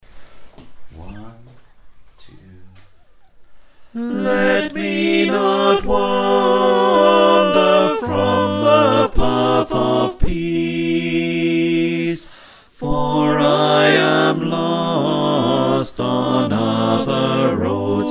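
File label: soprano alto tenor bass, choir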